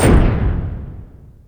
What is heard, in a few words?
Large Spike Pillar